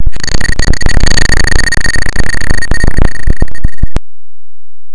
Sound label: bicycle bell glitched